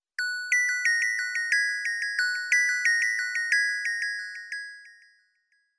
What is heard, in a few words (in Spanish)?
alarma buenos dias